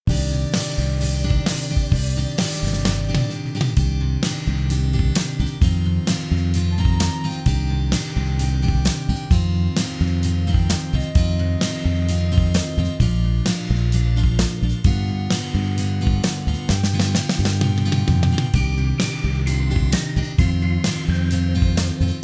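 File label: backing track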